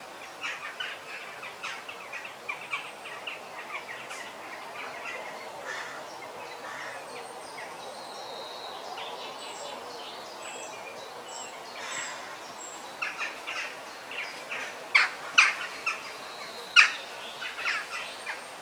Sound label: Sounds of the Forest Meadows and Birds